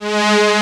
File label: VP ST G2, ANA STR